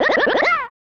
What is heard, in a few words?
Good Stuff Hit; tin star; snes